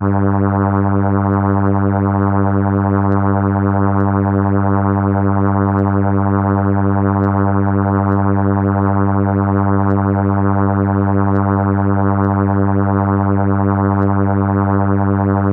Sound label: Horn G3, ARP Solina